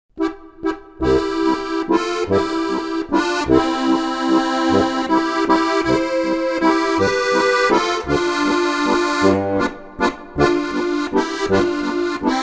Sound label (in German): harmonika